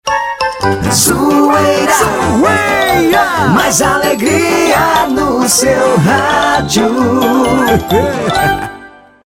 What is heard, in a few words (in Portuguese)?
vinheta